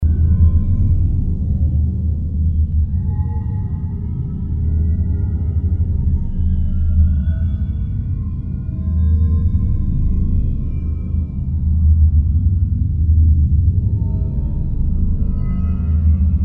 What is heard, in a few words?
ambience